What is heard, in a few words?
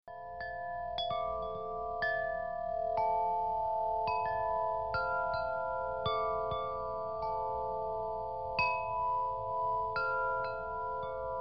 Large Island Melody